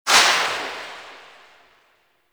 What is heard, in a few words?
Pistol Silenced Tail; Operators; Drifter